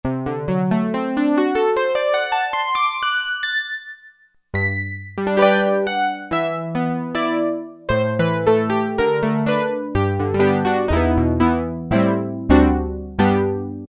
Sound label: Synthesizer Harp, Roland Juno-60; Factory presets